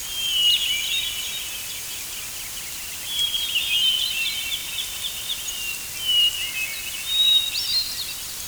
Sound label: woods; Fate